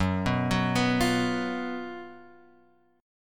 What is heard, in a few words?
F sharp Major 7th Suspended 4th, guitar; chordshape; down